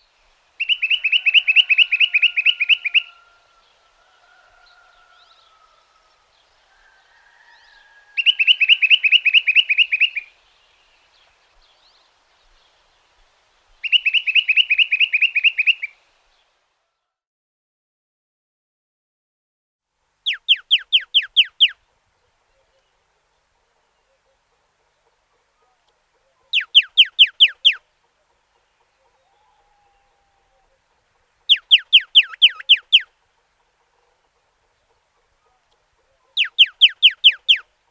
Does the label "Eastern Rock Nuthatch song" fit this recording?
Yes